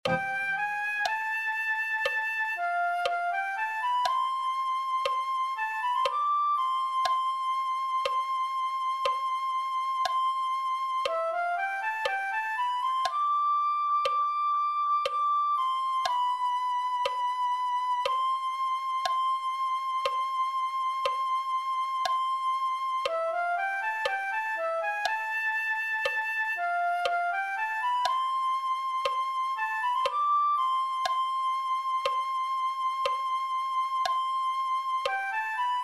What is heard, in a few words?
flute